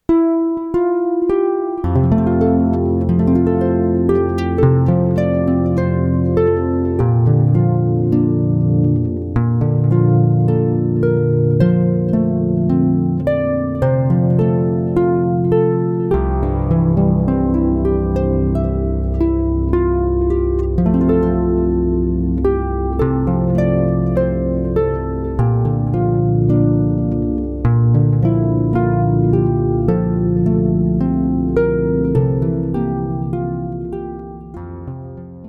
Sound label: Danny Boy Irish Melody